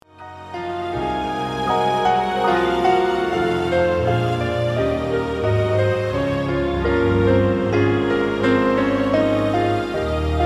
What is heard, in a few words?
Piano Concerto 1